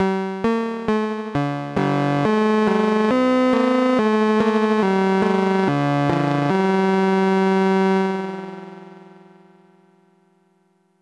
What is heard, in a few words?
kosmic shade piano sus